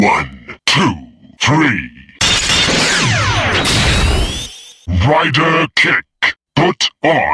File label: rider kick